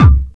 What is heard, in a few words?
boomy kick sound c key